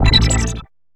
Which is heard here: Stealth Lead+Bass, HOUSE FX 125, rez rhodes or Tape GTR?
HOUSE FX 125